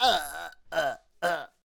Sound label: Barrel Roller Death Vocals